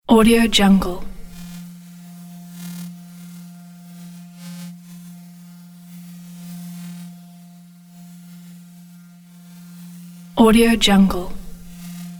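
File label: interference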